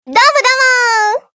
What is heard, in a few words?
happy